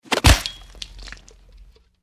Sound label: Chop Off Head Without, gore stabs; gore stabs & eat